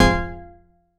stab core F, Noise; stabs

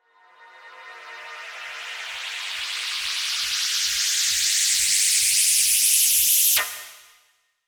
Sweep, Fx, Sweeps, Trance; Zero-G Trance Inducer

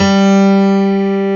PIANO G2

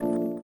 VIBRA; Spectrasonics; Metamorphosis

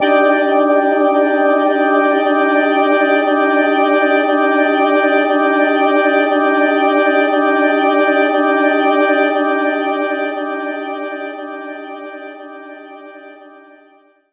Mood Loop Pad; Zero-G Jungle Warfare 1, 2 & 3; 90 s Sample CDs

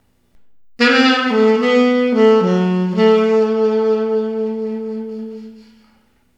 Sax Effect; Effected; Sonic Foundry (Sony Creative Software); Jazz Solos and Sections

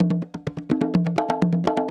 Percussion Loops, Bongo & Conga; Houseworx

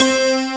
metallic lead; ST-XX Amiga Soundtracker Sample Packs

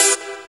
SYN ZISCH, dance synth; Sound & Vision - Gigapack I CD 2

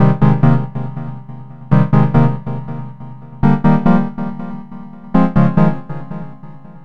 Progressive Synth, Trance

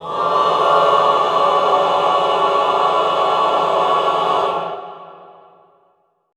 classical choir, cluster mid; Best Service; Extended Classical Choir

